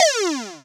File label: iceblock bump